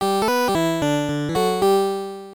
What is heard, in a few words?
Round Clear Music; Mappy; PC-8801